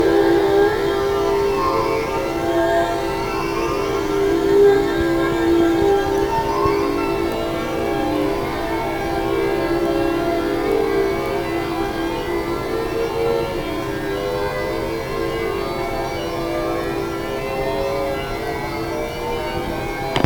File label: caress flange